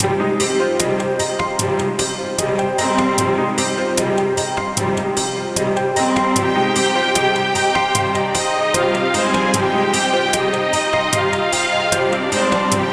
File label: Loop; 音楽素材